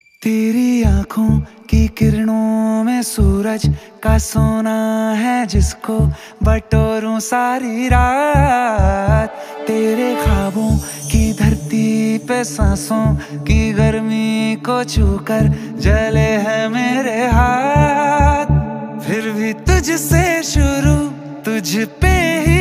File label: Ringtone